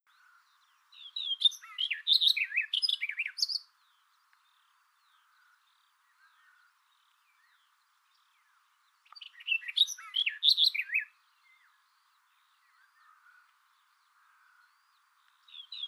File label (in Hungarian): karvalyposzata hangja